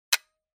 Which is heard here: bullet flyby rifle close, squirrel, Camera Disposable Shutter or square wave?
Camera Disposable Shutter